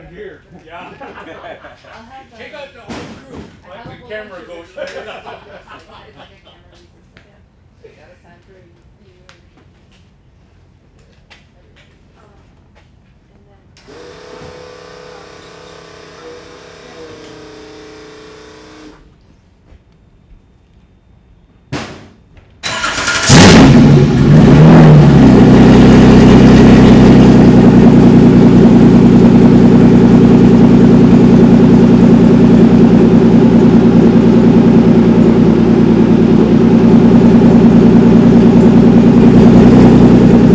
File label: Testing Engine